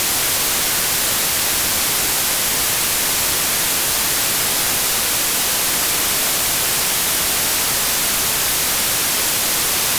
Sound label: White Noise Independent Channels